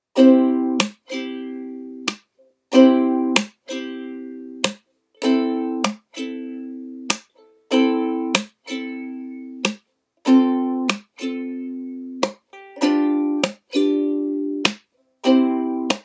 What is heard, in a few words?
standby